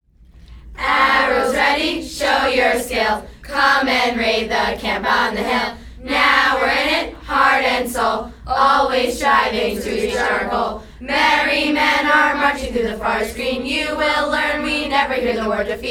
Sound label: cheers